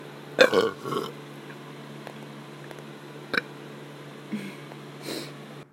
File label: Big burp